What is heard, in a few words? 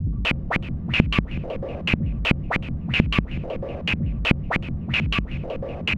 NRG SFX, Trance; Sonic Foundry (Sony Creative Software); Trance NRG VOL-1